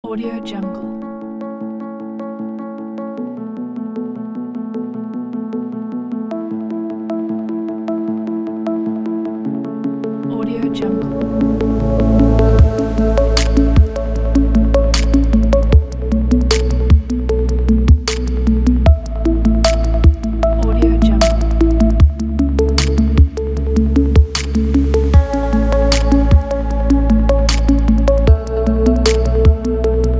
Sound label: modern technology